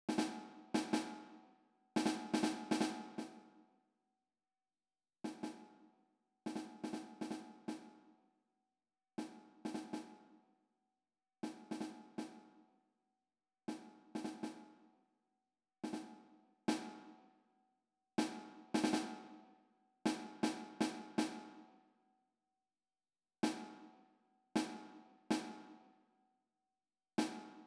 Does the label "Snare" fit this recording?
Yes